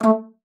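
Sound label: A3, bass flute; Xsample VOL-2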